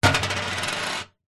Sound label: foley money coin set down spin metal table